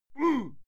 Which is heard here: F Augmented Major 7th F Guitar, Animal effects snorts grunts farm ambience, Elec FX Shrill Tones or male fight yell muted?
male fight yell muted